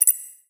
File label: UI Double Beep